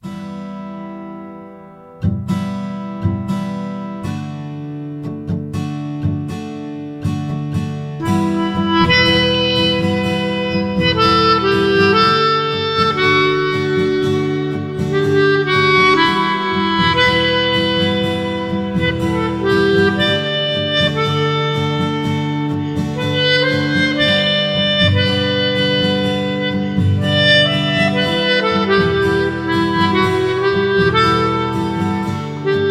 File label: melodica; the mysterious; weeklybeats-2018